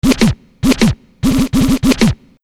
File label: Scratch, Hip-Hop; Construction Kit; Sonic Foundry (Sony Creative Software)